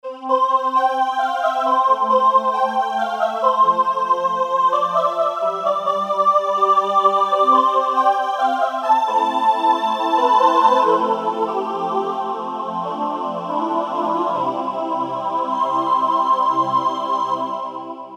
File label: Pad Classic Vox, Alesis Micron; Factory Programs